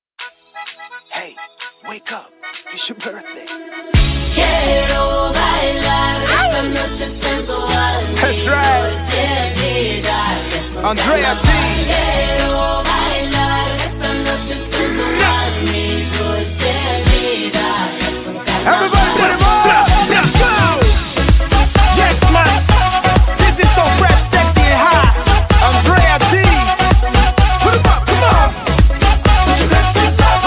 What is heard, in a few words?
melody